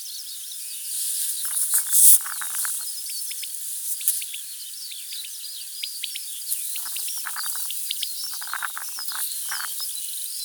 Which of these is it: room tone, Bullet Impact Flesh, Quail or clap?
Quail